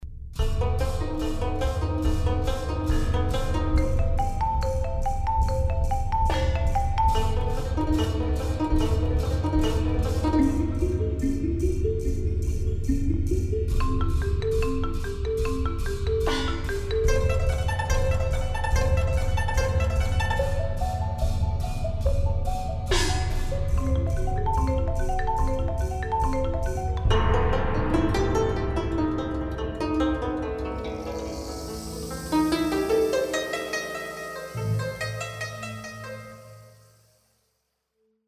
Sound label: Underwater